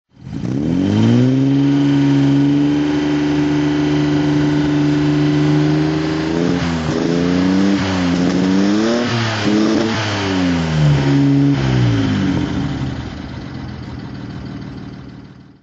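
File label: mg throttle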